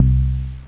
Dub Bass C2; BassHits

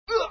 die; pendekar; Aventura

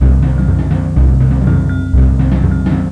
exciting music

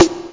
rev snare

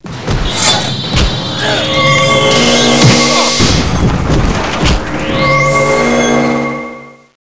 big fight

